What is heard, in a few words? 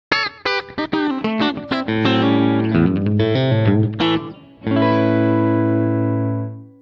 Dualtone full, guitar